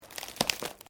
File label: Branches Dead Strain; Material components for constructing more complex sounds; Breaking (Sound Effects:Objects)